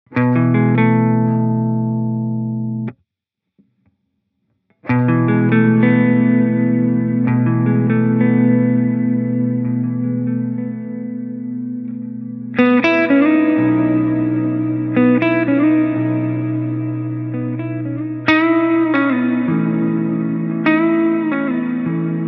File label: Delay Reverb Hall